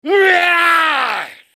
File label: pain